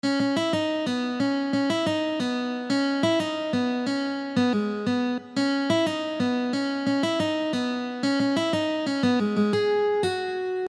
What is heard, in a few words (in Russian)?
na gitare; ataka titanov